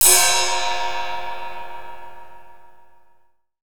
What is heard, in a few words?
Kit Heavy RIDE; CMI